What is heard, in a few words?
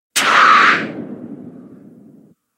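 fire extinguisher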